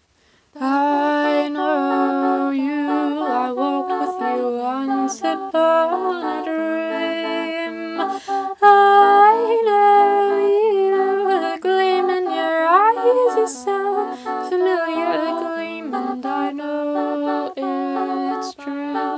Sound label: Singing; Sleeping Beauty